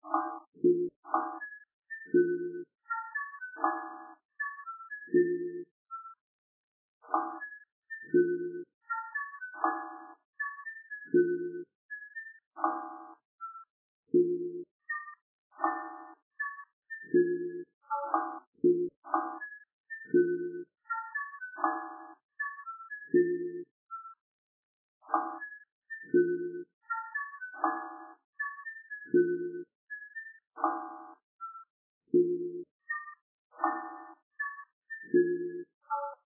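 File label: with drum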